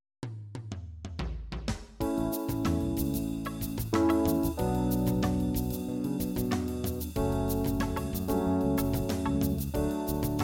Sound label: funk mell